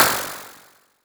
Noise

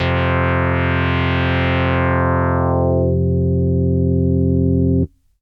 Lead2 Res Chord Vinyl Synths F1; vinyl chords

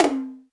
Tom Smack Hi

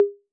Conga Hi A 808; Clean; Digital

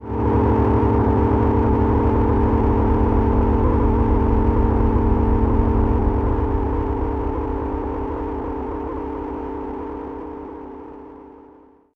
PAD; Dimensions Ov Mist

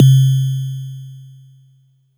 Sine Perc

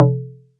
Perc C3

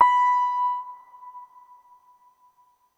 soft tines B4

